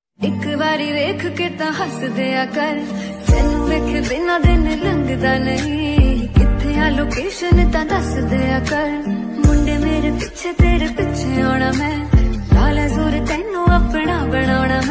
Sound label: Female Version Ringtone; ringtones